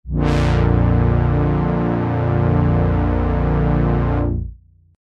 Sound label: brass; opx vst